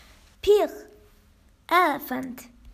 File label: Elephant